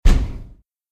Big Stomp; Quicksilver

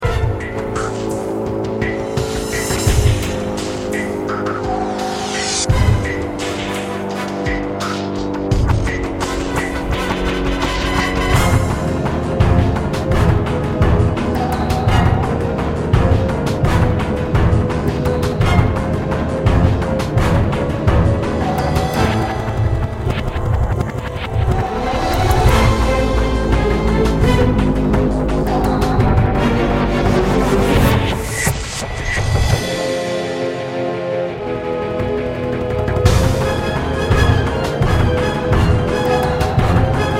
combat; Quicksilver

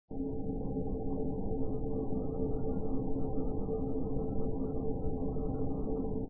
moonbase lull; Quicksilver

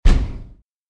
Big Stomp

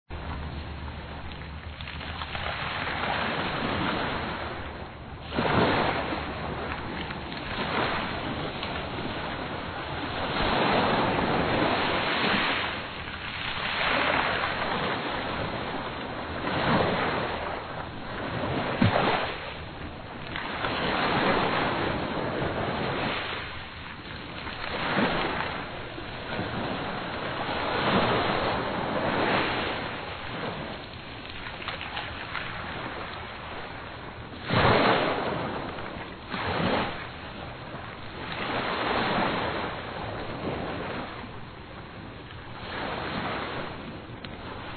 pirate waves; Quicksilver

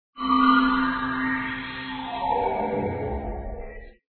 square warp; Quicksilver

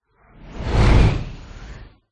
whoosh

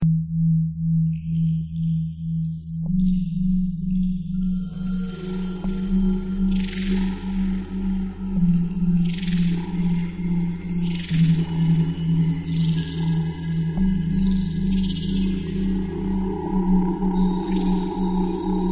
Pirates Cave bg; Quicksilver

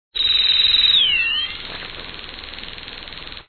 moonbase zap; Quicksilver

